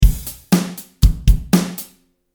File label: Drum Humanized